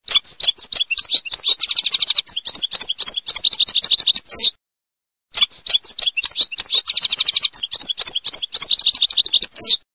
CARDELLINO, birdsound

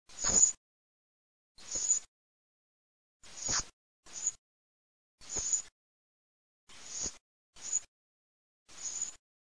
SASSELLO

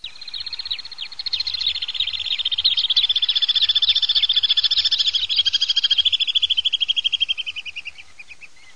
rondone grande

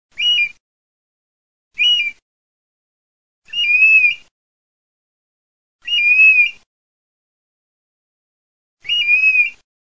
PIVIERE

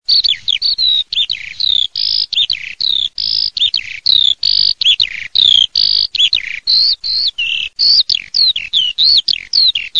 allodola, birdsound

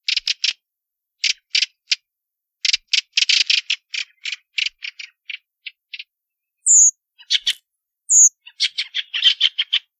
Sassello schiamazo

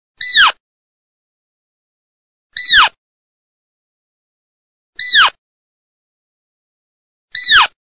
CAPRIOLO

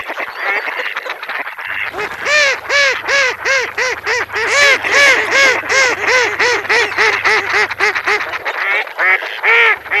GERMANO

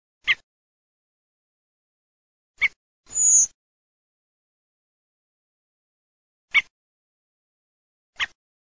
SASSELLO CHIOCCO

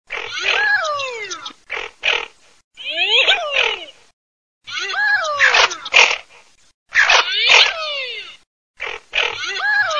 moretta, birdsound

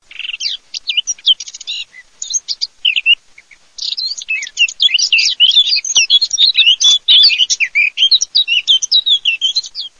CAPINERA

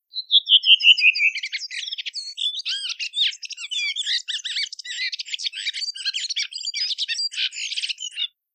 Tordo sassello

